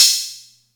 Atlantex Music Percussion Computer Cymbal Short, drum machine